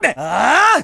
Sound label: Ending Blow; Link's Ending Blow (downward thrust)